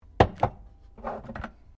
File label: JAR GLASS PLACE CUPBOARD; Various kitchen jars; Set Downs; Objects